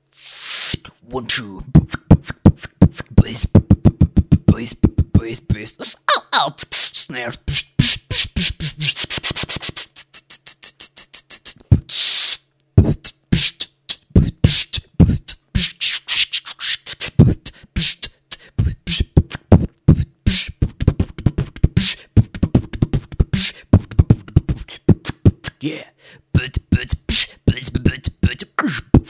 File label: wake up bbox